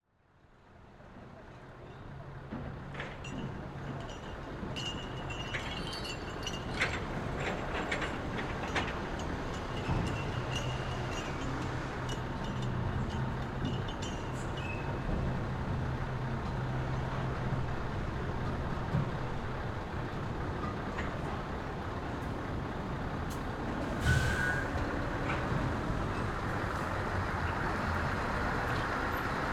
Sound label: Dock Ambience Trucks, Cranes, Loading, Cargo Ship; Dock Ambiences and SFX